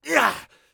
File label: bear2 hit; Escape from Tarkov